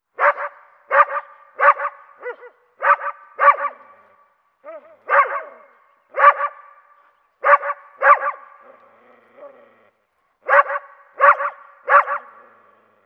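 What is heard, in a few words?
animal dogs distant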